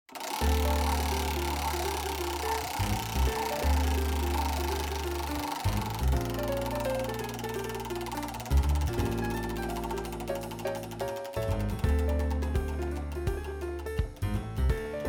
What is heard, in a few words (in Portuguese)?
musica roleta